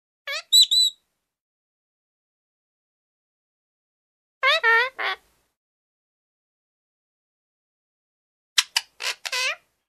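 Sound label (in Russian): звуки попугая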